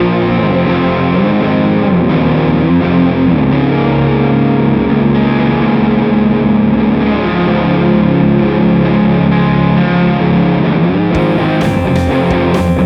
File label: Symmetry Fuzz; Black Hole